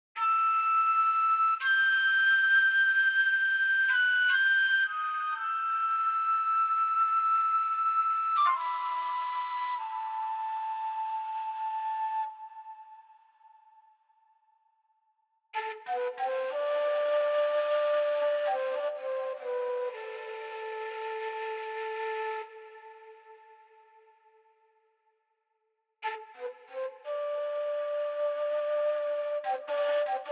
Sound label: Lost Desert Flute